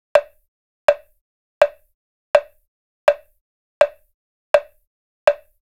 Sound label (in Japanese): クリック